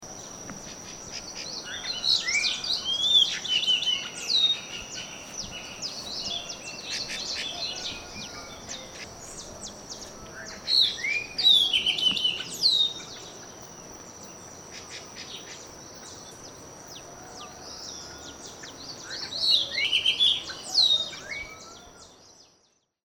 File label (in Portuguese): Garibaldi Chrysomus ruficapillus; Jacauna